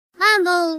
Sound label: clear, Tetris